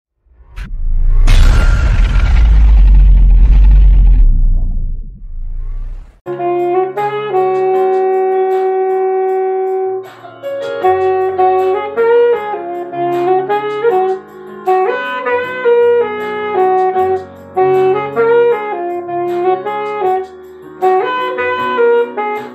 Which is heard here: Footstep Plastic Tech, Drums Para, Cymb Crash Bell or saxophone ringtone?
saxophone ringtone